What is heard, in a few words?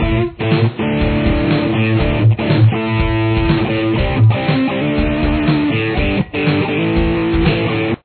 intro guitar